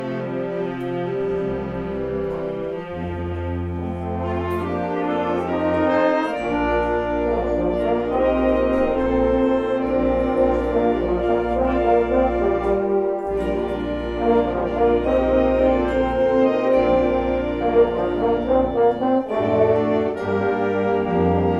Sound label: Fanfare